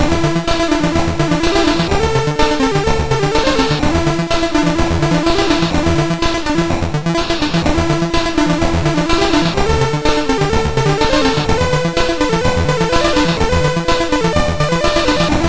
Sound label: Commodore 64 Music, MOS6581R2